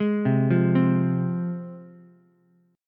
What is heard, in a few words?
C Suspended 4th Sharp 5th C Ukulele; arpeggiated; 1,0,1,3; JGuitar